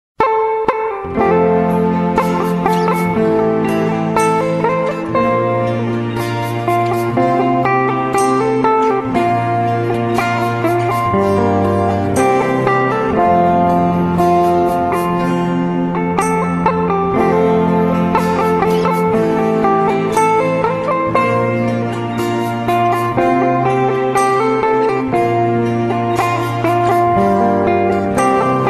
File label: Sape